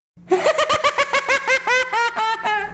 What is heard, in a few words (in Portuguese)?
risada do maconheiro